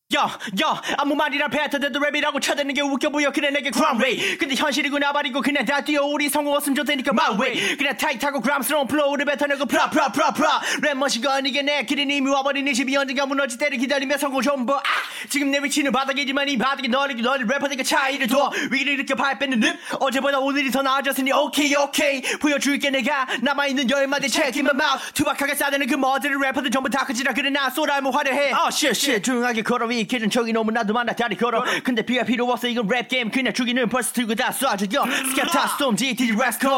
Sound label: Hard Grime Korean 24 bars Verse grime 140 Db, Acapellas